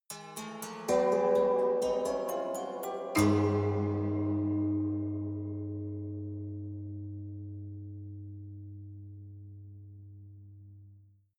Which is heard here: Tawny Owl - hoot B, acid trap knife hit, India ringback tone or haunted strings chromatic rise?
haunted strings chromatic rise